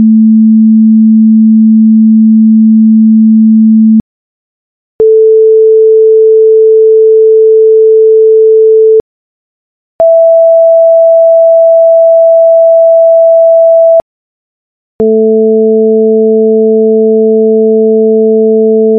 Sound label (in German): superposition 3 schwingungen